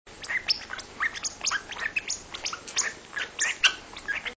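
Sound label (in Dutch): jong 3 weken, agaporniden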